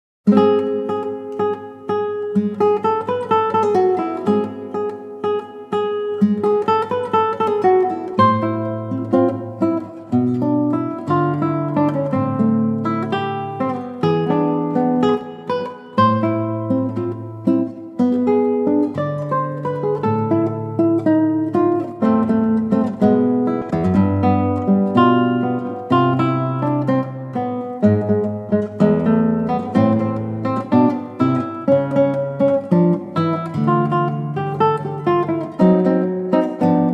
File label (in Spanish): Un toque de tango